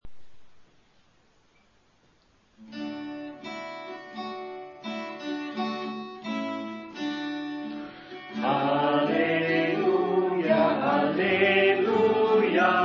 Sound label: Alleluia Amen; vormsel